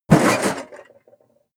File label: toolbox handling rough